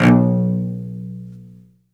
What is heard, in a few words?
SOLO CELLO, Strings; ILIO